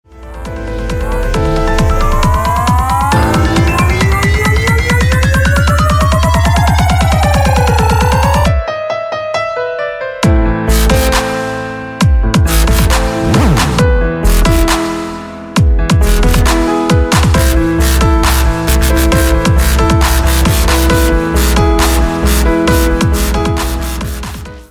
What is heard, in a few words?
dubstep piano ringtone